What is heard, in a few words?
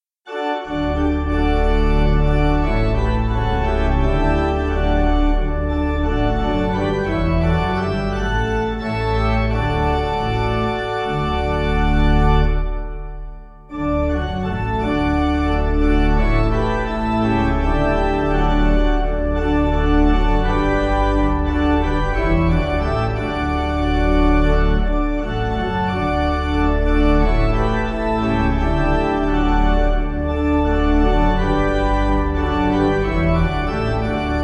Pipe